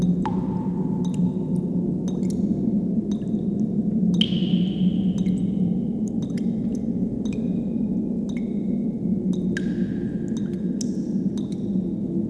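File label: ambient deep drips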